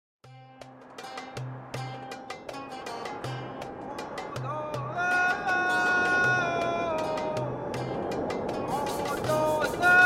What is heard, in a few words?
oral traditions of calling camel flocks; Alhedaa